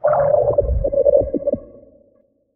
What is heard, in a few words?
Watcher Attack; Watcher (Legacy)